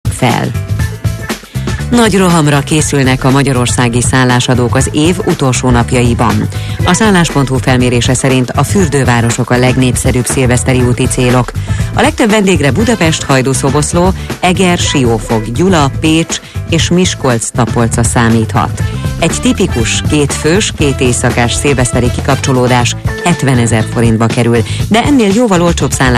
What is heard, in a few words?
Jazzy